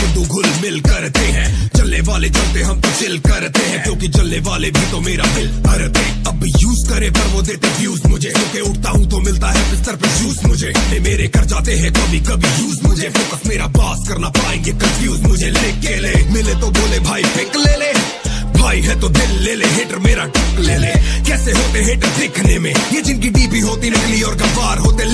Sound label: sad ringtone